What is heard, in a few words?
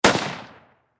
Warfare Rifle .30 Caliber M1 Garand Semi Single Shot Distant Perspective